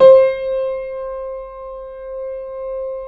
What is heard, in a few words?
Keyboards Acoustic, C4; USB Soundscan vol.17; AKAI